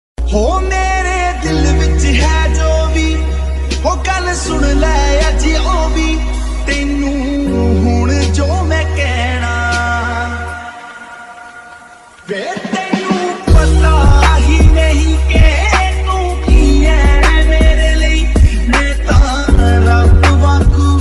bollywood ringtone hindi ringtone love ringtone

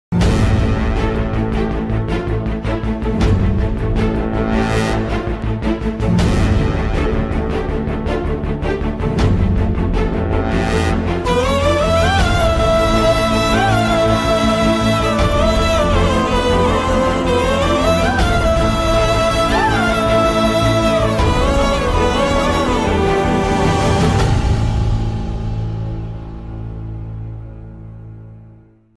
emotional bgm sad bgm; namma veetu pillai

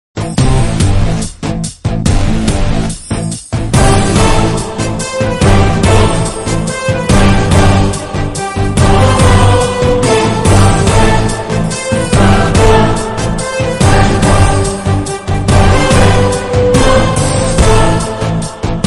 movie ringtone call ringtone; Srimanthudu movie; Mahesh Babu

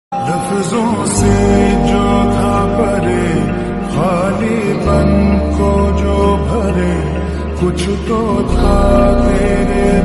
slowed reverb song

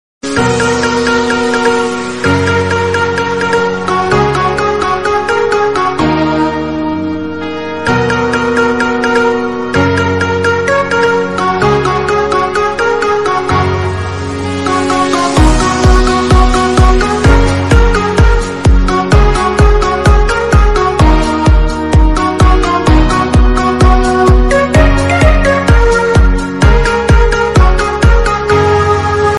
instrumental ringtone

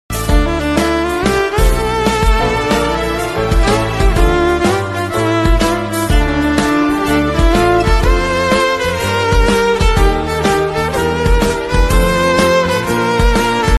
bgm tamil song sad music melody sad

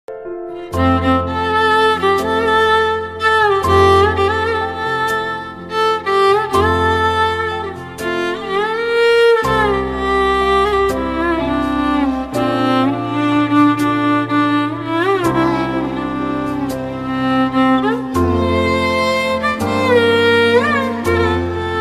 love ringtone new mobile phone ringtone

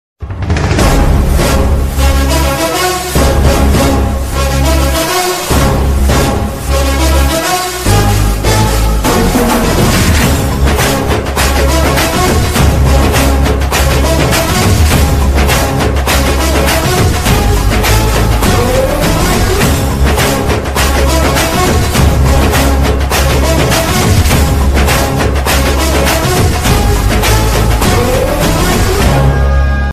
bgm ringtone mass telugu bgm; bengal tiger movie; ravi teja; south bgms

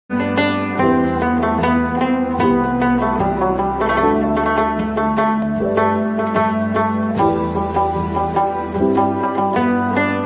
beautiful Kashmiri ringtone

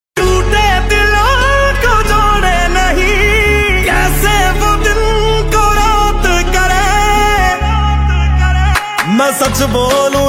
song ringtone sad song ringtone

